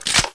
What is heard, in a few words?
scout clip in, weapons; cstrike